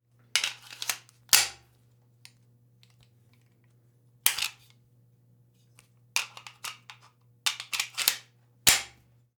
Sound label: Gun Loading